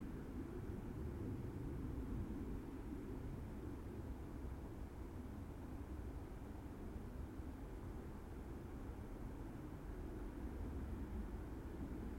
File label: yamanakako sound